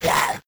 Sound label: skel head loss; Crypt of the NecroDancer